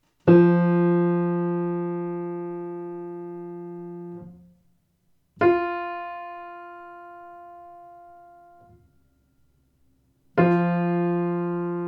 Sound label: F3 F4 Intervals